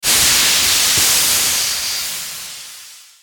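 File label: breath, basilisk; basalisk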